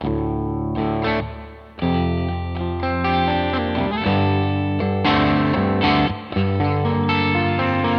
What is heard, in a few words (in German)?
antelope audio edge note hamburg441, Mikrofon